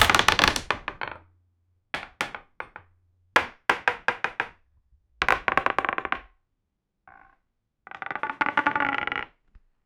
big wooden house collapse; building

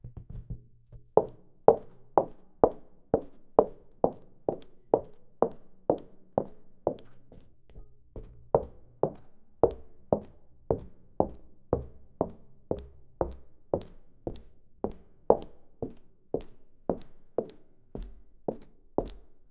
loud sound of footsteps, clanging

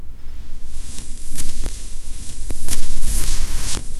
the logo falls in a, transition

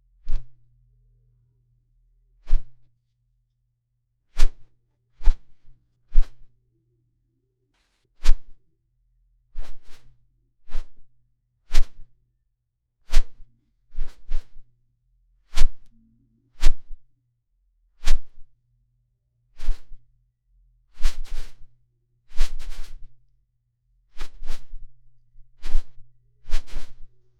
big dragon wing flaps; air movement

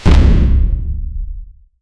sudden and giant explosiv, explosive

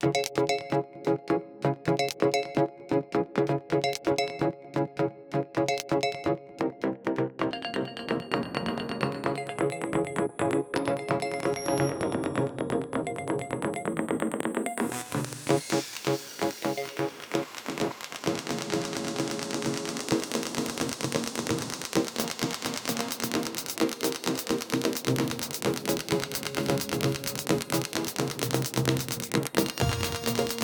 beat and percussion loop, 130 bpm

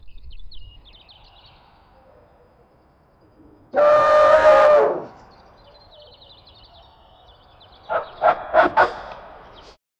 eagle attack, bird call

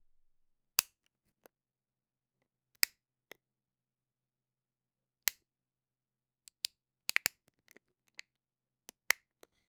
opening a lotion, lid

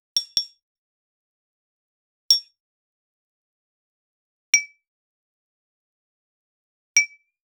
the sound of liquid glass, liquid

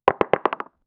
realistic but punchy soun, golf

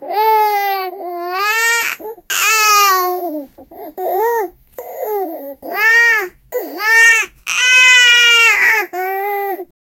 two baby loughing happily

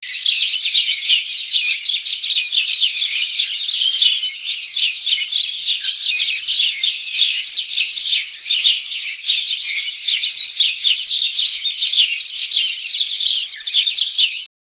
morning birds chirping

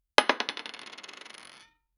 the sound of a pile, tabletop

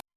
modern countdown cue thre

